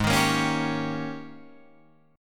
G sharp Major 7th Suspended 2nd Suspended 4th Guitar Drop D